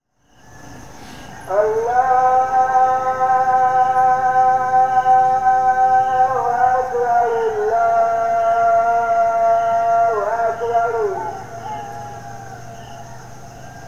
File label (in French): le muezzin; voyage au burkina novembre 2018